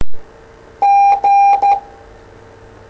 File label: Morse G